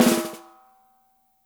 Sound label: dry, snares; Spectrasonics - Burning Grooves CD2